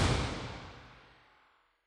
Springy, Synth

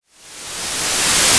Reverse noise, panned

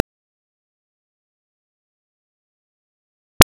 6 second burst, Test Tones

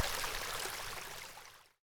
Creek

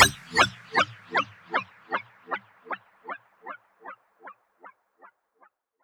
Laugh, Synth

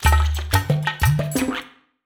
Frog beat, Synth

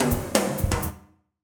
Drum beat, Synth

